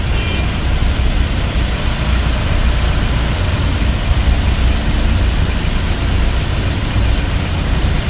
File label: ambient traffic; AssaultCube; Alien Stars; American Muscle Series